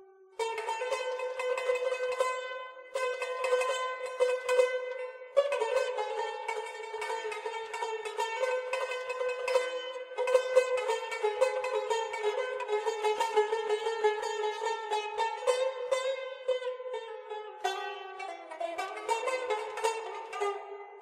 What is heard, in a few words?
bayati kurd; scene6